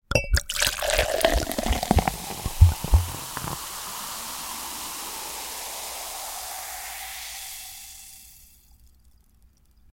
Ice Drop Into Glass Chugging Soda Pouring Into Glass w; Household